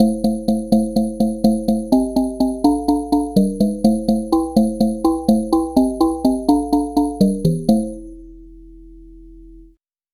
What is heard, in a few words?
glockenspiel bass